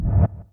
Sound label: creep exit; Counter Strike Model Pack; CS:GO; survival